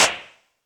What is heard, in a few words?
Claps, Elektron MachineDrum SPS1 MKII